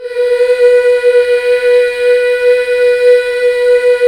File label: MMHH, BACK. MMMHH Fem